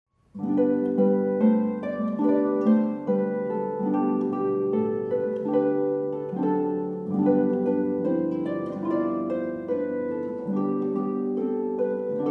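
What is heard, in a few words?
chamber music string quartet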